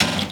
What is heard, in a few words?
perc wash; Drums; One Shots; Slate; AURORA Sample Pack